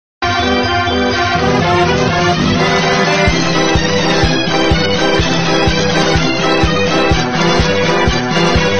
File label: circus music playing